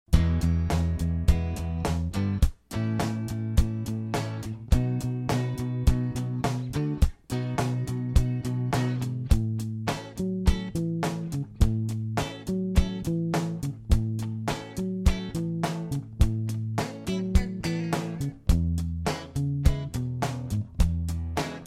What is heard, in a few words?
R B Bass